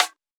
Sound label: Hiphop snare; Dry original